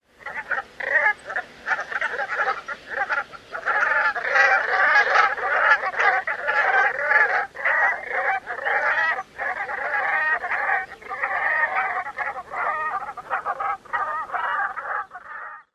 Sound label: Brant, flock flying overhead